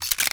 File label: Refill Clip; Warframe; Duviri; Boon Powers